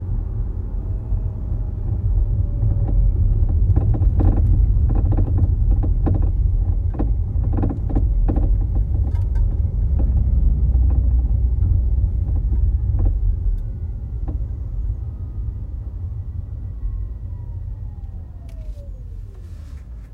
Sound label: new rattling noise